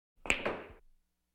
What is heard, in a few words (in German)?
billard - 1 queuestoß; Sport